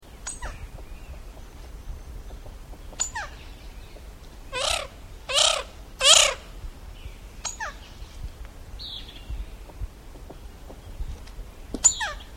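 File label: Carpintero Lomo Blanco, Cream-backed Woodpecker, Campephilus leucopogon; cantos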